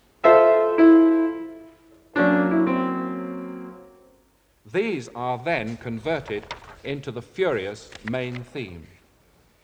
Music Example (Piano)

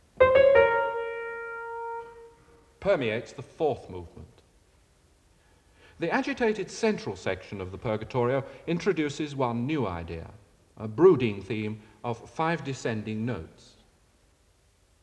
Music Example (Piano); Deryck Cooke; Illustrated talk, Symphony No.10

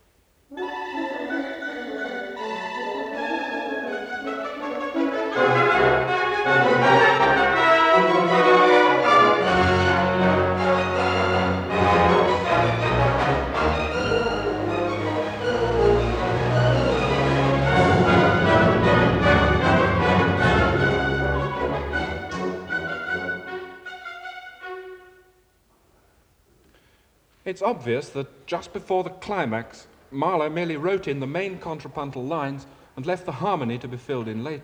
Music Example (Orchestra); Deryck Cooke; Illustrated talk, Symphony No.10

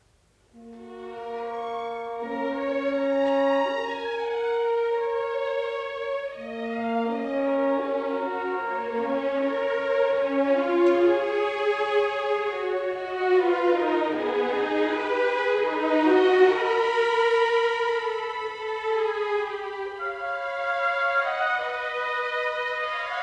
Music Example (Orchestra)

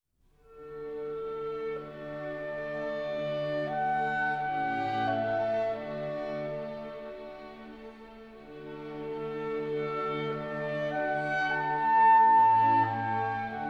Clarinet Concerto in A Major

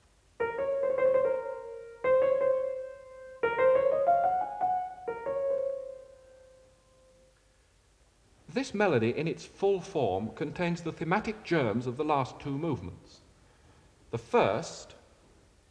Music Example (Piano)

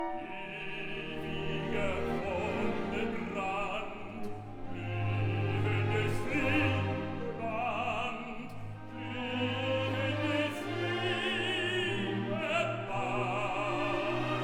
Symphony; Mahler Symphony No 8; New York Philharmonic; Lorin Maazel

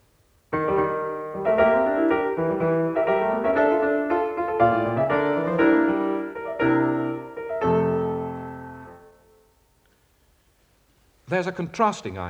Music Example (Piano)

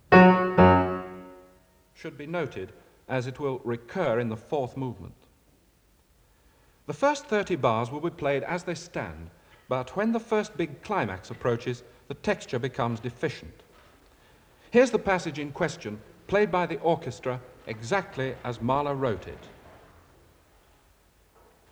Music Example (Piano)

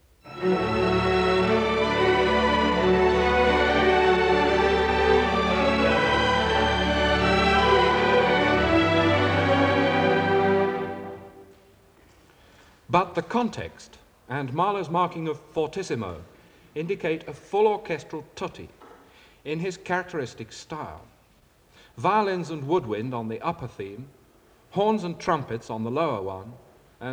Music Example (Orchestra)

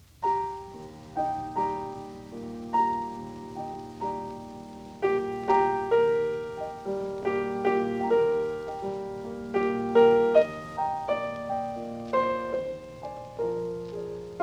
Wiegenlied; Brahms; Opus 49, No. 4; arr. by Rubinstein; The Rubinstein Collection

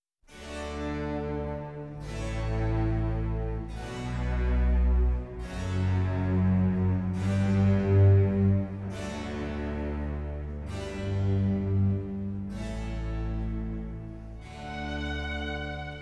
Canon

